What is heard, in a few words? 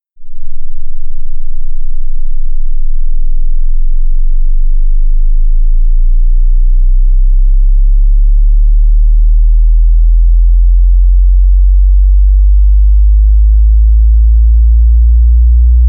Subwoofer Test 10Hz 100Hz 10Hz; Audi A7 Subwoofer; Audiforum